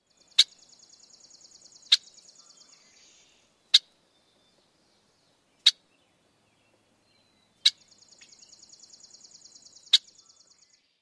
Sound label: typical chack calls